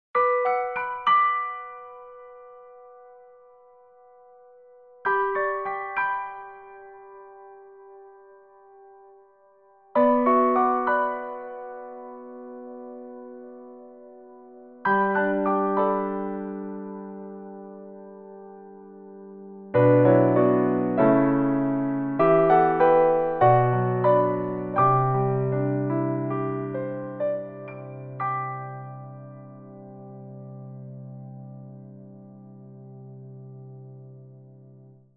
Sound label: Montage Soft